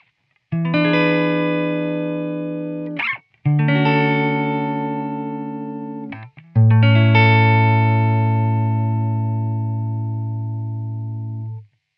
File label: riff clean emulated